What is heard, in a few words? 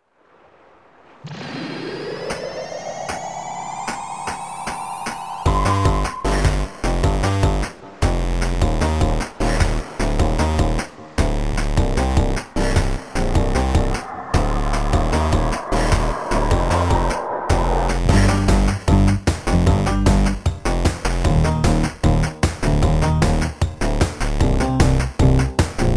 8bits, guitare